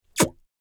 Elderly woman smooch; kiss

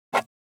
Ballpoint pen underlining on paper

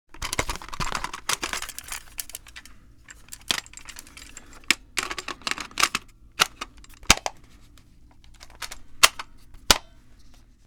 Cassette tape handling rattle close perspective

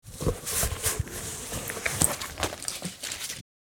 Bulletproof vest put on cloth rustle; Military Equipment

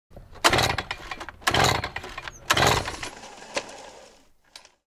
Leaf blower engine noise start; household

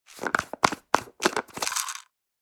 Plastic pill bottle twist cap opening

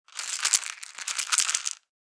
Pill container shaking pills inside; medicine

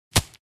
Paintball gun impact hitting a human body; sport

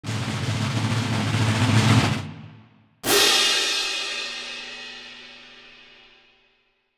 Drum rolls with cymbal crash

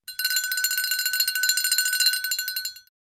Small toy bell light jingling

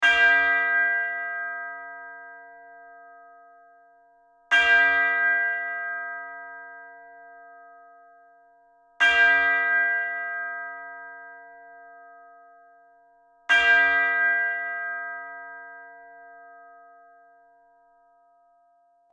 Steady church bells ringing

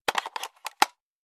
Cassette deck player portable insert tape

